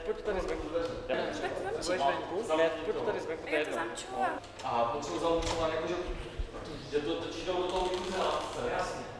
people; Mafie 1

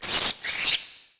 rat; Mafie 1

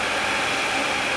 rail; Mafie 1

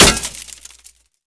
metal; Mafie 1

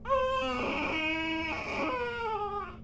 baby; Mafie 1

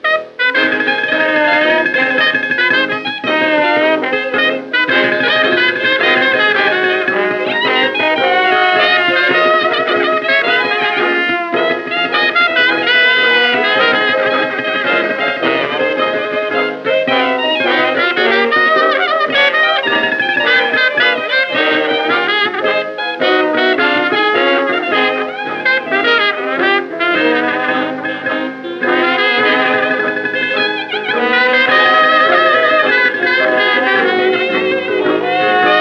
music; Mafie 1

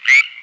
frog; Mafie 1

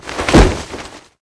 pad; Mafie 1

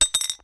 metal; Mafie 1

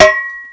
metal; Mafie 1

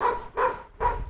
dog; Mafie 1

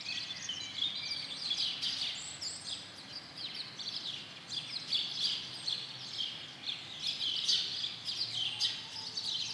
bird; Mafie 1

